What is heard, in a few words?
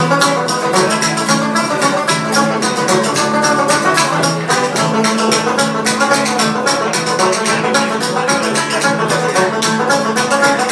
some local music